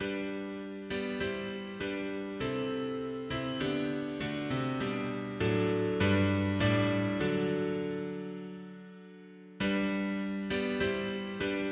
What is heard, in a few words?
mono piano; hymns; Himnario grande